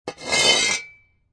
Shovel Single Scrape Concrete, Foley, Shovel, Metal Shovel, Single Scrape On Concrete